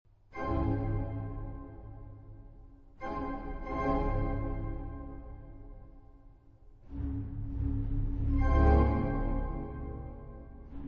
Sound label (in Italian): agitato e marcato